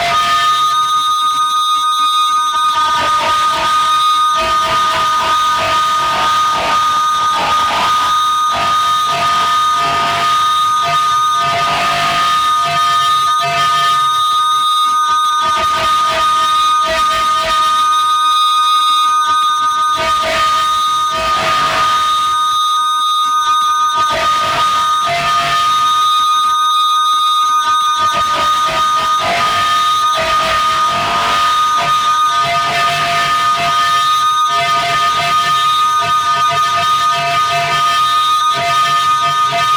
No Input Feedback loop thin ringing high irregular distorted metallic